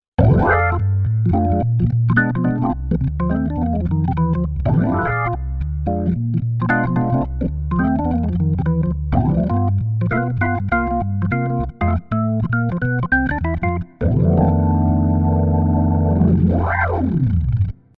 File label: Crumar Mojo Desktop Onboard; Max Tempia